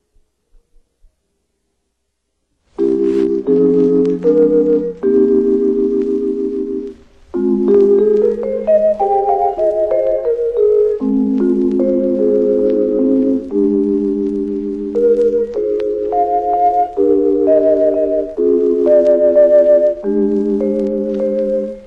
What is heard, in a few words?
vibe sequence; Vibe Sequence played on FM while WQMR am signed on at sunrise